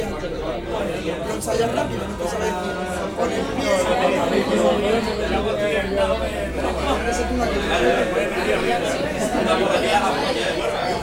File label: In A Bar